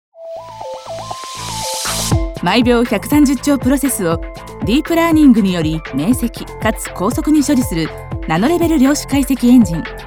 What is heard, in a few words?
bgm; BGMあり; ナレーターランクⅢ